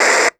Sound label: Walkie Talkie Static; Communications